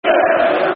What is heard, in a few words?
Rooney, Rooney, Football Chant; Man United